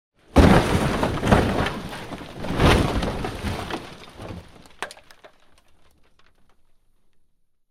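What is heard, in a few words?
rolls down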